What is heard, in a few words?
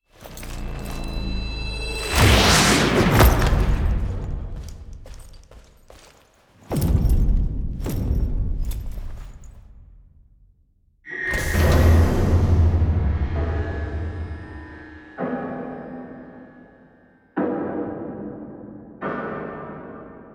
Fall Down Embraced SFX; gameplay; Arthur; Warframe; NineteenNinetyNine; Stage12PartyOfALifetime